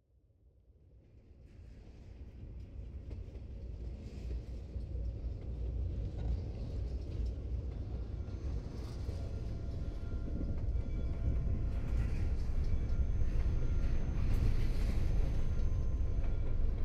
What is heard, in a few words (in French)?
Le voyage en train BINAURAL; ULMANN